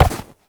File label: Step Snow; Movement; Uriel; Warframe